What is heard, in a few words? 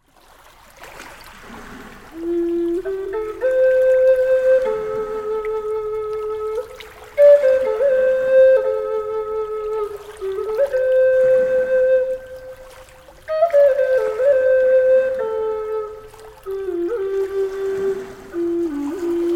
Bamboo Sound, Flute